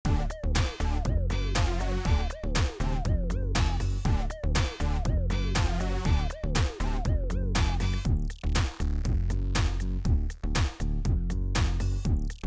Music; Rickey Smiley For Real; Snapchat

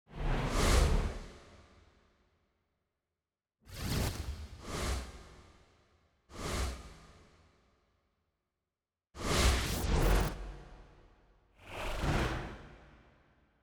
SFX; Front Row Seat

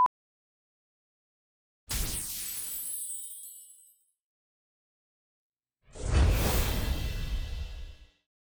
Drama SFX; SuiteSpot; RnB Divas LA S2

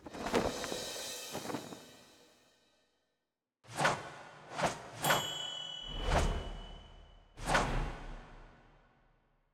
SFX; BornAgainVirgin; Watch Laugh Share

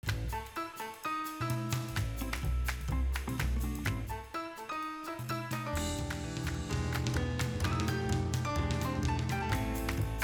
MUSIC; BornAgainVirgin

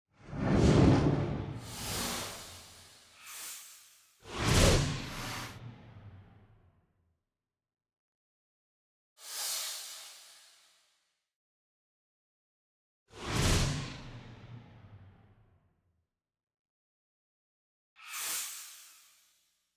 SFX; NewsOneNow; CableOneSpot

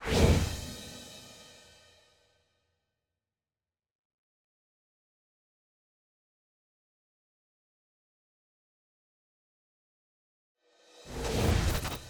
Teaser Male SFX; Rickey Smiley For Real

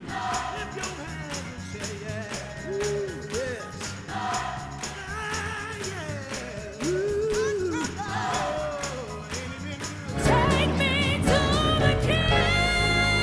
Gospel Tradition Music; BMM Gospel Tradition

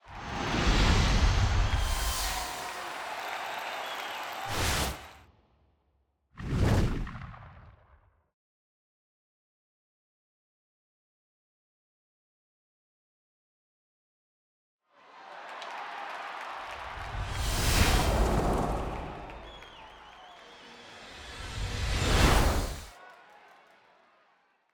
SFX; Black Music Month

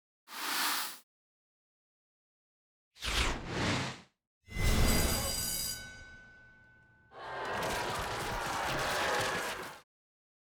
SFX; Everybody Hates Chris; Back to School Marathon

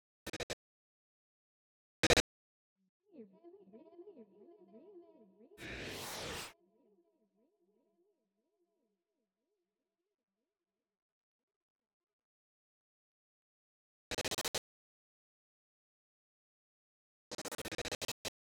SFX; Talent Brand Spot

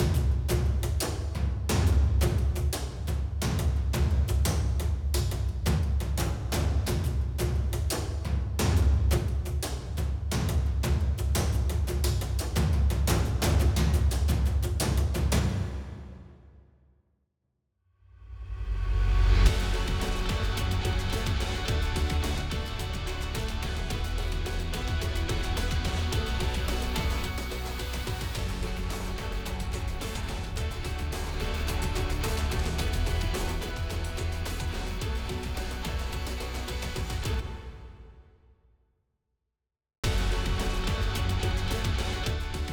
MUSIC; JULY MOVIES; TV1

